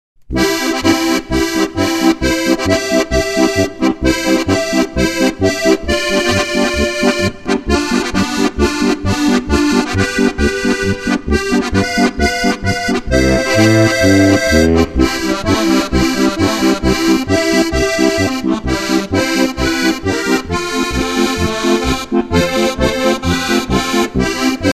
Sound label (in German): marsch